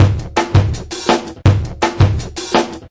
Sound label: live distortostep, drum loops and breaks, 165 bpm